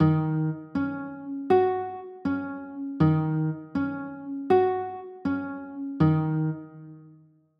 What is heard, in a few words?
e flat m7, chords